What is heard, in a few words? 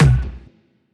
Tom, Percussion; Vengeance Samples